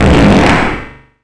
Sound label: omg fart